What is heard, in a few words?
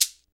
PRC, AFRICAN PERC; Roland LA